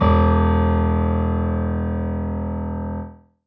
keyboard acoustic, acoustic grand piano

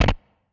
guitar electronic, distortion guitar

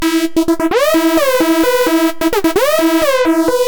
eb alarm

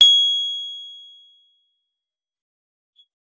guitar electronic, clean electric guitar

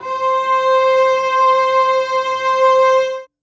string acoustic, cello

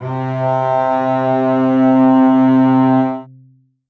string acoustic, cello

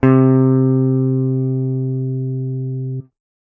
guitar electronic, clean electric guitar

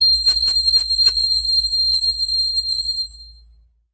reed acoustic, clarinet

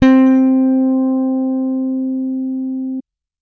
bass electronic, electric bass finger

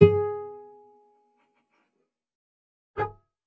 bass acoustic

